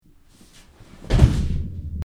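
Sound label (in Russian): падающее тело ударяется об пол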